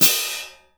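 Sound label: cymbal, Orchestral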